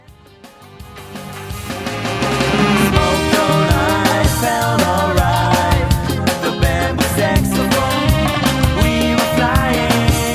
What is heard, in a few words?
Bamboo Saxophone